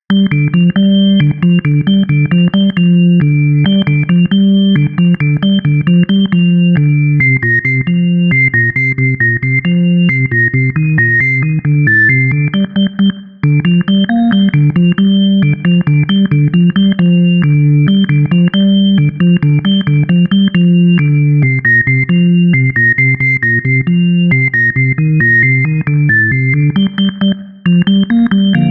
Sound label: organ; HALion6 : Model C; Dark Comp+1